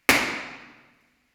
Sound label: IR Sweep vs MLS